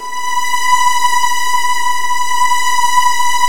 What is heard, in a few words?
B3, Virtuoso Strings; ILIO; Kirk Hunter Virtuoso Strings VOL-1